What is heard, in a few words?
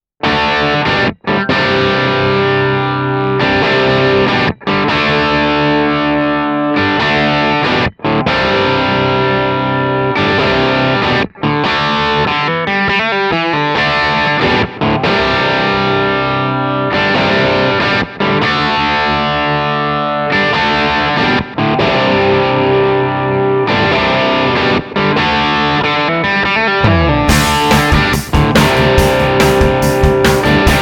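crunch modern, Guitar Dry Sound – Guitar Wet Sound – Guitar + Band; USA Modern ’90 Crunch (Mic Pre Amp Tube Manley); From 0″ Guitar Dry Sound – From 14″ Guitar Wet Sound – From 27″ Guitar + Band; Kemper Profile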